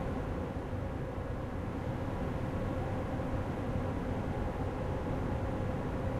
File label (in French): Chauf Eau Loop